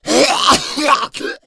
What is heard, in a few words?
smoker lurk; voice, idle